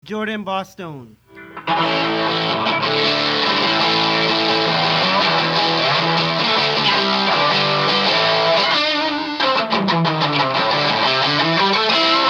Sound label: bosstone, pedals